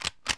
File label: Shutter